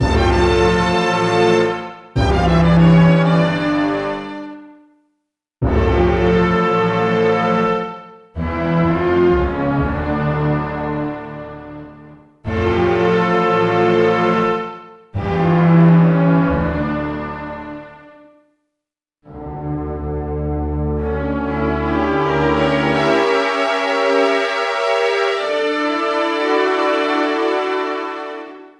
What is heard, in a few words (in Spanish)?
Ritmo Caótico